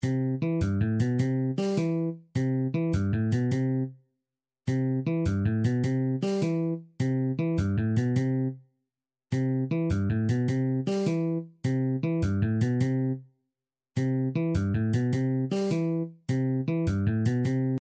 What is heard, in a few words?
pipe; Gameboy; Super Mario Land